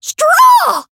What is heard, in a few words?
attack; makiwara